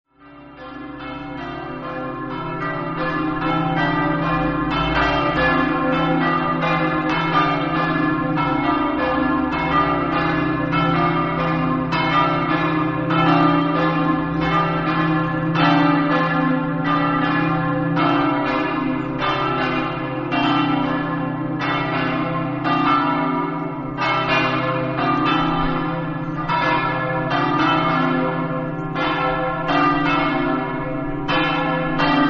monte, campane

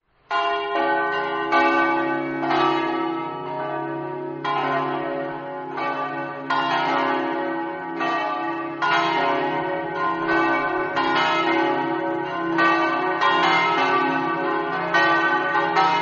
cisterna, campane

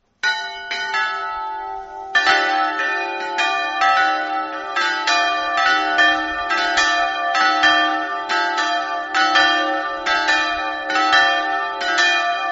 aveacco, campane

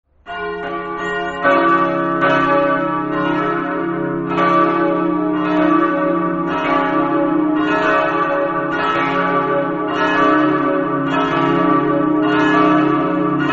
rodeano, campane

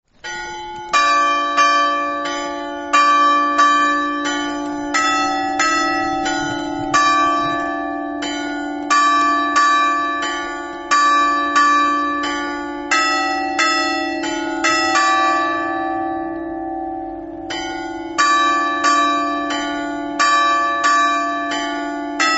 campane preval

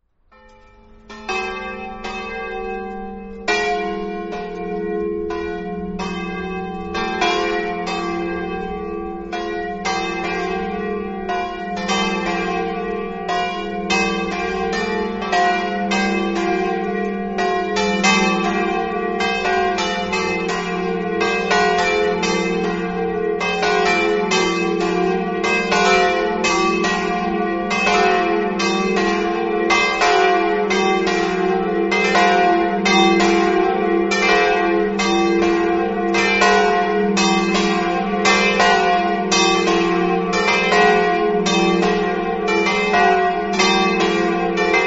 lignano, campane

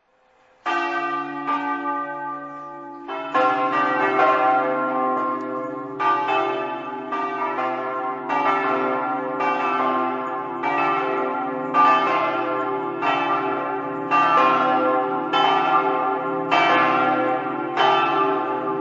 svito, campane

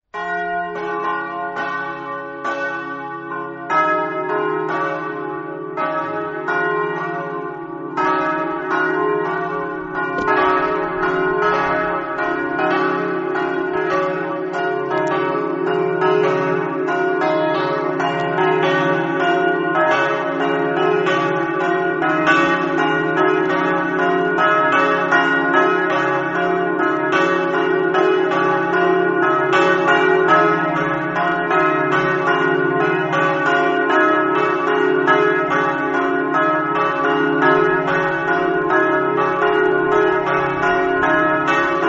vissandone, campane

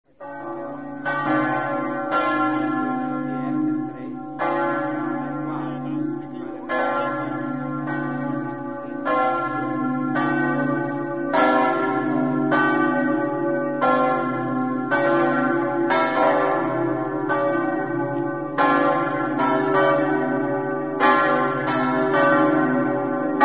ovaro, campane

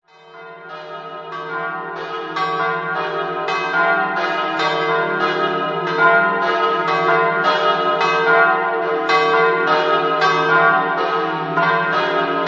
galleriano, campane

